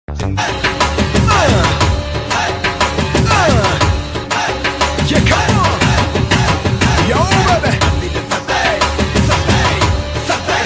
intro bgm music telugu bgm; vikramarkudu; raviteja

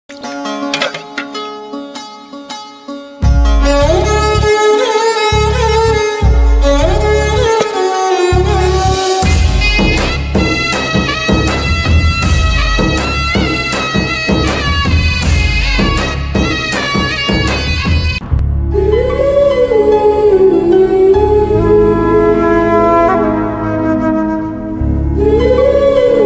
love bgm telugu; nuvvostanante nenoddantana bgm

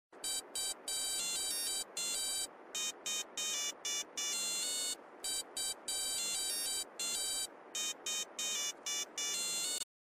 pager ringtone; grand theft auto 4

